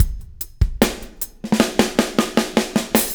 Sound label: REV, REVEREND; Spectrasonics; Backbeat